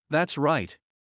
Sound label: Heart fast; Classroom humanbody